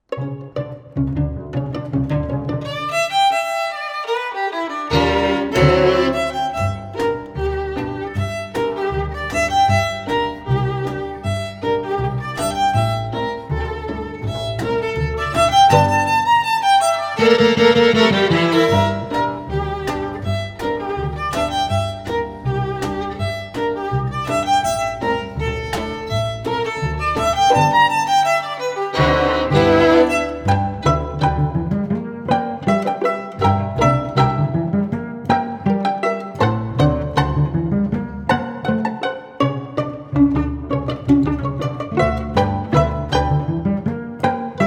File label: Jazz Pizzicato